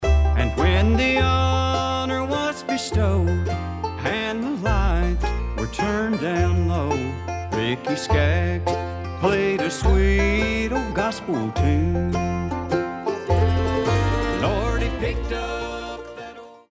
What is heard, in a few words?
Ol Mandolin